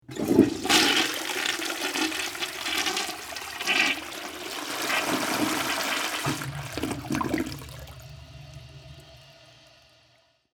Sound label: Toilet Flush; Bathroom; Household